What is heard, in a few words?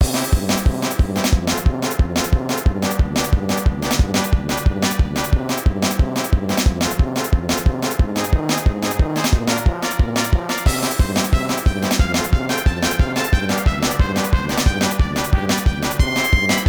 Comical Dungeon; Tales of Eternia